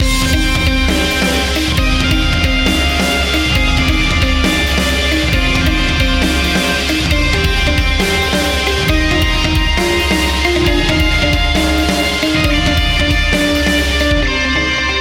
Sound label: tones loop